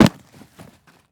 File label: Weather Snow Impact Duffle Bag Dropping On Snow